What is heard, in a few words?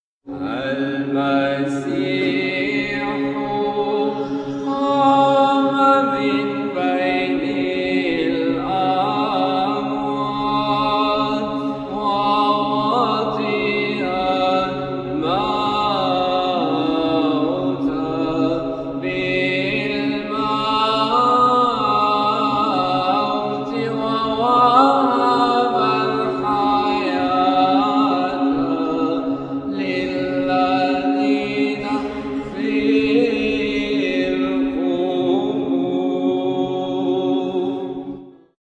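Christos Anesti Arabic; Stoudion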